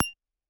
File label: Click